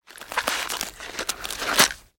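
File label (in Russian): неторопливое шуршание собранными в сумке вещами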